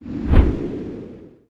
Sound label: windmill